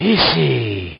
LAUGH; BattleSNDS

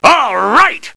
LAUGH; BattleSNDS

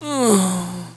DYING; battlesnds

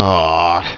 LAUGH; BattleSNDS

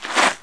STAND TO CROUCH

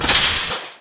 FENCE OPEN

